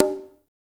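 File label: CONGA, right; Spectrasonics; Liquid Grooves 1 & 2; CONGAS+BGOS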